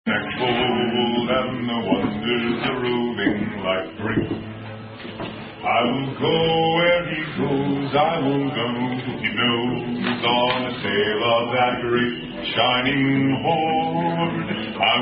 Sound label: Bard Singing At The Gilded Ewe Tavern; SCA Event Fort Knox; Unknown SCA Song